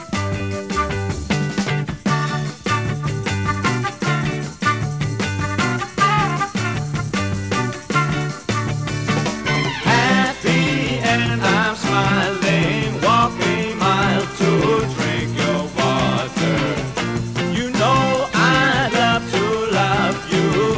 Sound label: rock